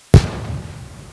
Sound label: explosion